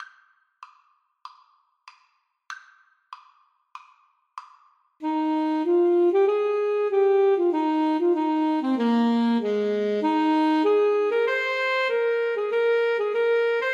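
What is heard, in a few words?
Alto Saxophone Duet, karaoke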